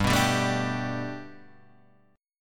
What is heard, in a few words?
G Major Add 9th, Guitar, Standard; strummed